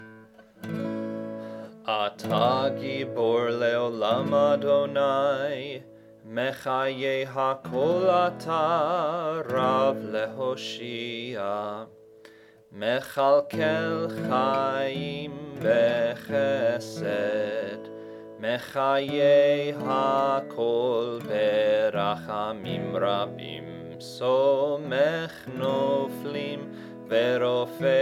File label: G vurot High Voice; Hebrew